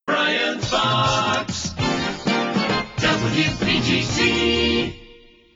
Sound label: jingles logoset; priority one